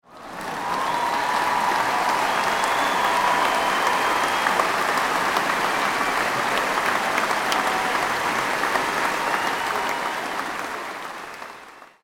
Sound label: applause